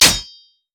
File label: basic attack; Kennen